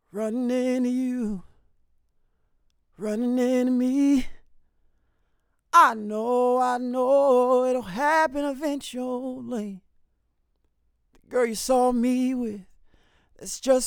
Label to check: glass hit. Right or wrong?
Wrong